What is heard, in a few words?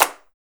soft hit clap; JesusOmega {NM} 『Planets』